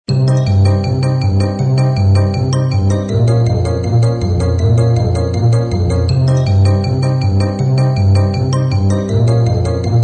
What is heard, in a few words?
Comedy Fun loop